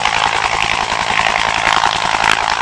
tail rotor, ec145

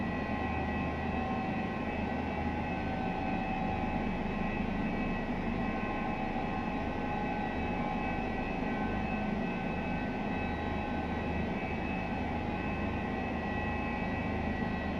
H145 Flight Idle right, Headset; ec145

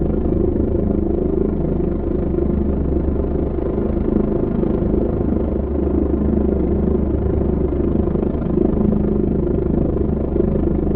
H145 Vortex In right; FGUK

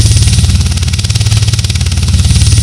blade vortex interior, ec145

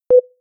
record signal; FGUK; FGR2Phantom

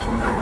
R44 Engine start

